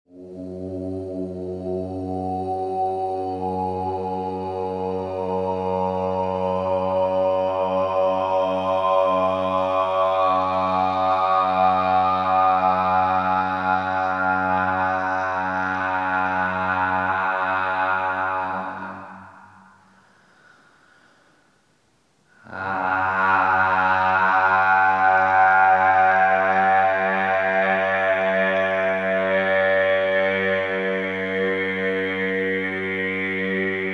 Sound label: The Vowel Triad